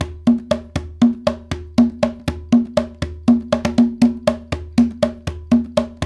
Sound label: Bembe 6/8, World Dance; Spectrasonics; Supreme Beats